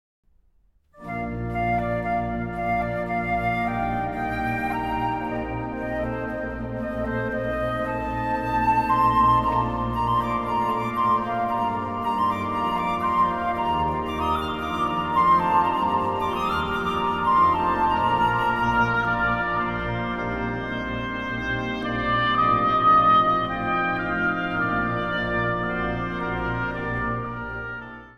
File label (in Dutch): Instrumentaal; Het Hervormd Mannenkoor IJsselmuiden/Grafhorst; witter dan sneeuw